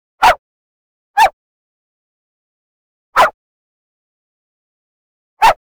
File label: a dog short bark; pokmon